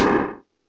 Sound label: GATED C3, ENSONIQ SQ-80; Rubber Chicken Software Sample Bank